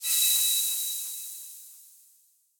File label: shutter; osu skins; Luxariah Default Minimal